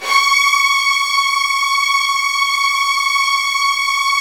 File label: Strings C#2 R; Virtuoso